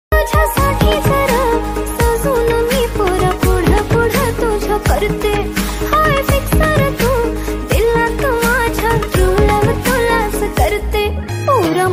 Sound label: Marathi Ringtone